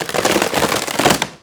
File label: Coffin Emerge From Ground; SFX Kit; Foley - Misc